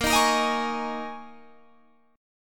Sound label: D sharp 7th Suspended 4th A sharp, Guitar